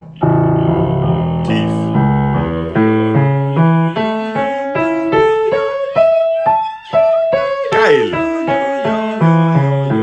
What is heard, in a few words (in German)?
5 Oktaven